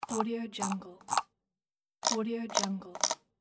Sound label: mouse wheel scrolling